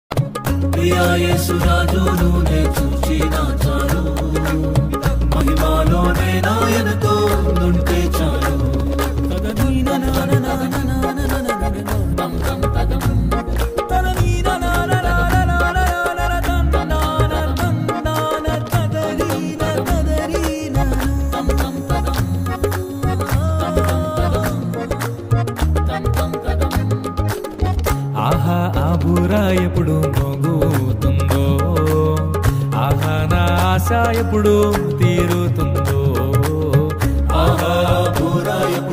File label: ringtone